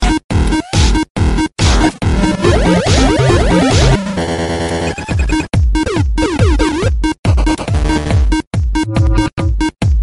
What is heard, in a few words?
disco sms ringtone; iphone4